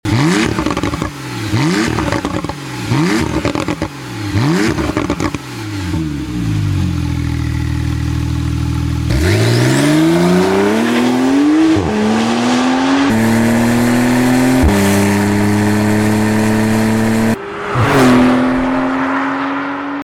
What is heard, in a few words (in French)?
Sonnerie Porsche 911 Carrera S 991 MK2; Techart